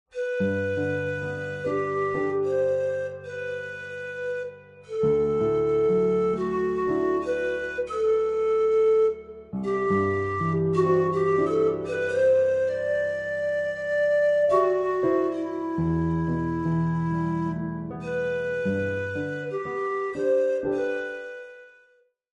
panflute and piano; Music; DailySounds

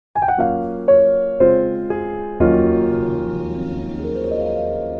horror sting; Music

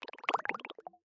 electronic bubbler short

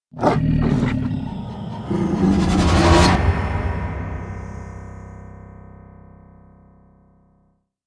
lion roar cinematic stinger

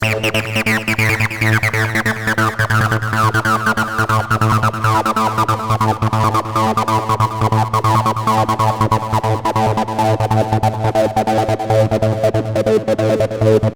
acid lead starter; SFX; DailySounds

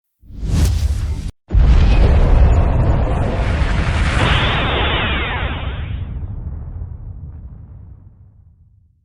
submarine implosion

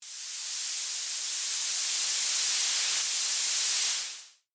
light tv static; SFX; DailySounds

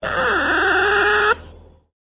otter mama call; Animals; Otter Mama Call 2; DailySounds

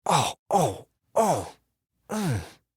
short groans male

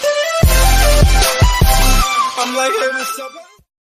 hospital hallway; Ambient

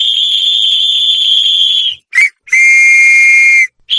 skateboard roll; Foley

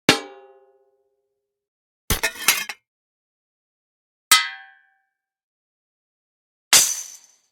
various impacts metal; SFX

